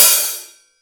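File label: HI-HAT CLOSED; Greytsounds; HI-HATS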